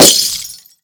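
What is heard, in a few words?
destruction fluorescent light bulb; Explosions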